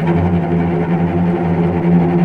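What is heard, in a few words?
tremolo, strings; East West - The Ultimate String Collection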